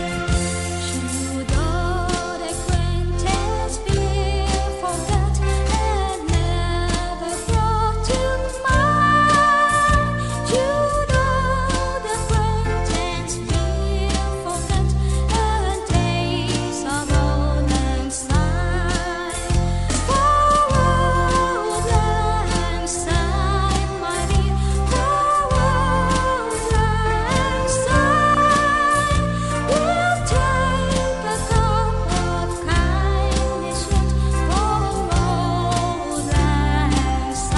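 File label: auld lang syne, ringtone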